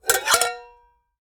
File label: Metal Lid; 1001 Sound Effects